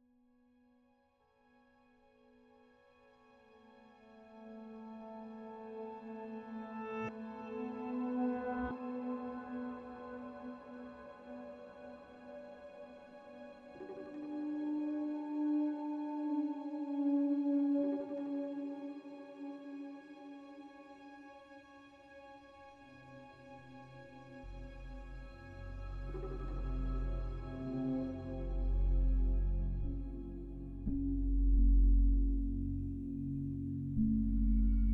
Between Release and Tension

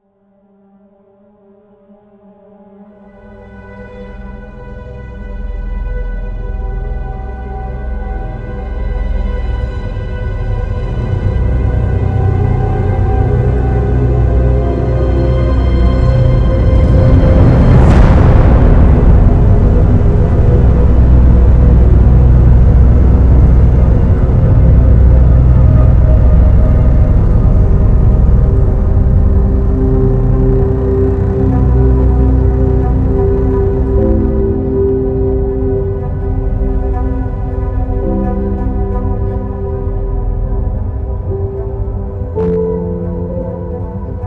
Inense Flute effects Dark Danger

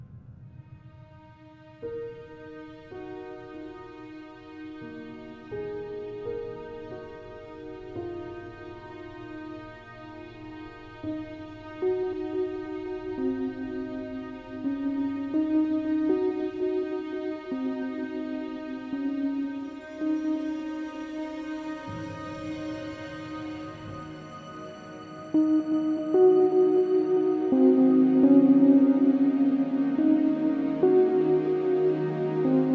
Sweet Drama Underdialouge Family Simple

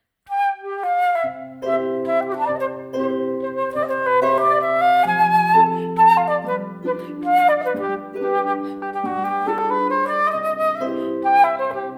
Music only

